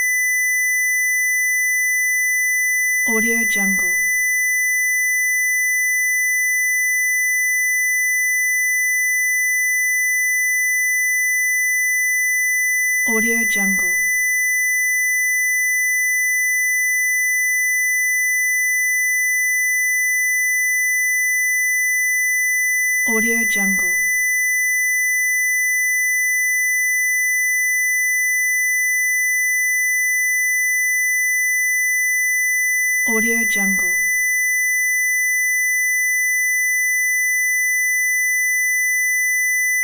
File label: test tone square wave; prosoundfx